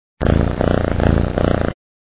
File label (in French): ronron